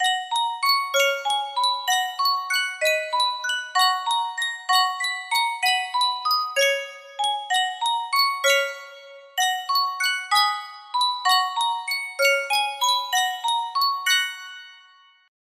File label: thorens music box